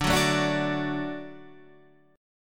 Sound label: D Major D down Guitar Half Step Down